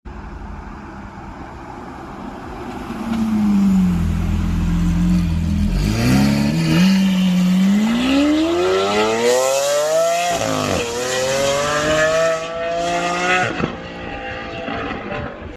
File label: Lamborghini Aventador